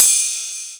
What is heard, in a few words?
SP12 RIDE CY; Greytsounds VOL-1